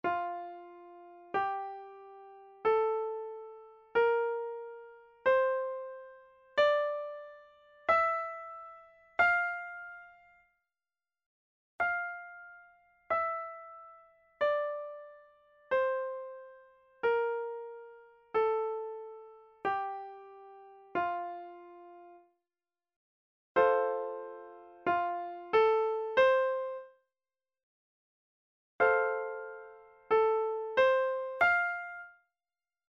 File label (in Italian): Esercizio sulla scala di FA maggiore